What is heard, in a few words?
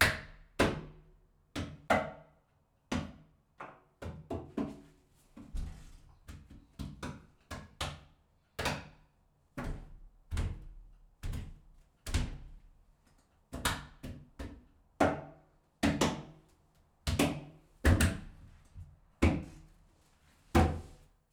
Empty Bathtub Bumps Hits